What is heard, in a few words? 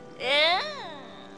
eeeew